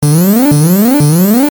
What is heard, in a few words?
alarm